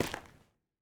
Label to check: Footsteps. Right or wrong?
Right